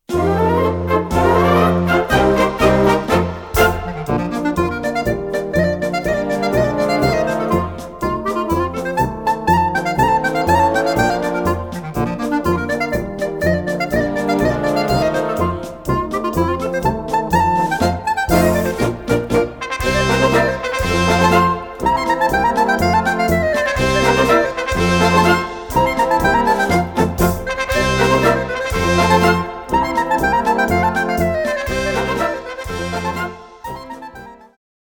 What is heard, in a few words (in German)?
Der Klarinettenmuckl, Blasmusik